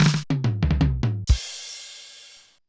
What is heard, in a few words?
Loop, mxr; classic machines